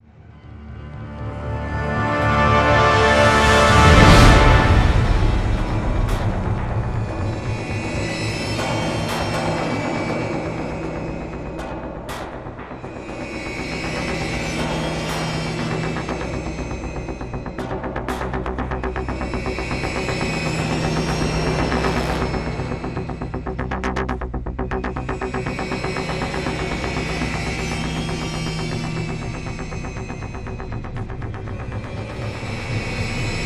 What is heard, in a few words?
long pulse; transformers war for cybertron gamerip; MATRIX OF LEADERSHIP